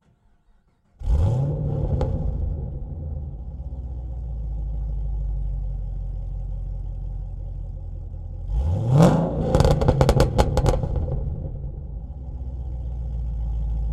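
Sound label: Onboard On Idle Blips Off Exhaust Mix, Camaro ZL1 2014; Pole Position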